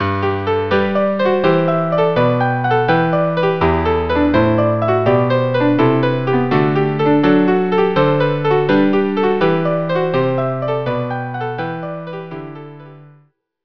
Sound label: string trio